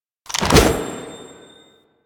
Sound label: Grenade Throw; Warframe; Quests; The New War; Kahl; Abilities